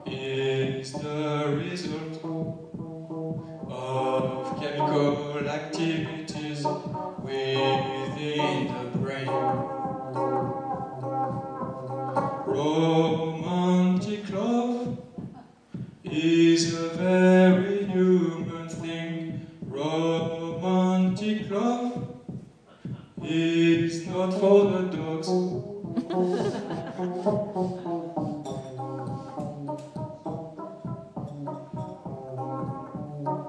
playing a song with a beating heart beat and tiny guitar; paul granjon